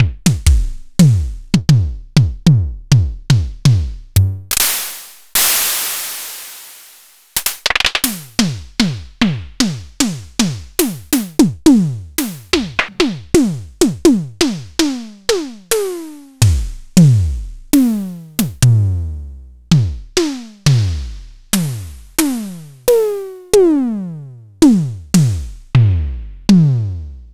Simmons SDS5 all

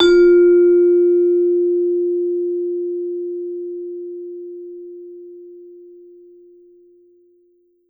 VIBE MED FF F3; Marimba & Vibes; Sampleheads; Dave Samuels

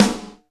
Snare, Alesis DM5 Drum Machines

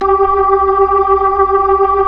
B-3

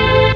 Stab; FutureMusic

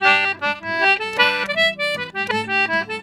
Celtic Flavours; USB Soundscan vol.42; AKAI

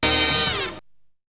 Hit; ZERO G - Datafile DISC 1

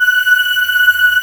VOICE, choir

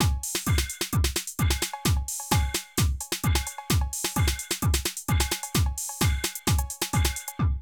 Drum loops, Euro techno

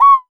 Hoo High, Cuica, Percussion; Roland BK-5

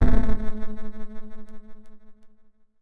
Tone, IDM

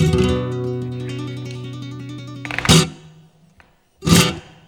GUIT CHORDS; Best Service; World Colours

